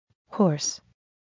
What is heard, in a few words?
horse